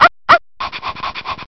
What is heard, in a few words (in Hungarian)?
kutya, állat